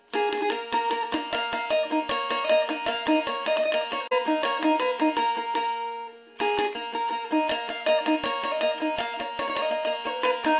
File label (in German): Klingelton